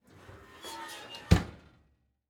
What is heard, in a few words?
Household Oven Close